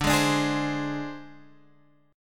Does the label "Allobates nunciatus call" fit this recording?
No